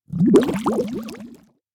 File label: freespins logo hide; Hungry Shark; Wazdan